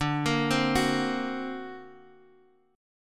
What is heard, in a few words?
D Augmented 7th, Guitar, Open D